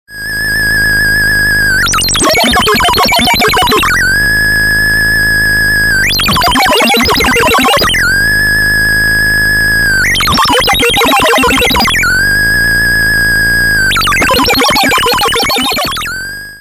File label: Thingamagoop vs. Thingamagoop